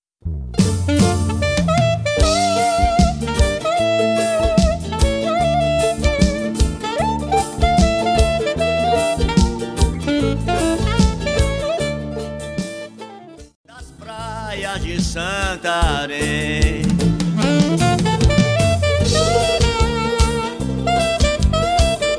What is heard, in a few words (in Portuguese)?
Solos De Sax Alto, Xote Santareno